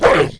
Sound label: wolf attack; The Elder Scrolls III - Morrowind; were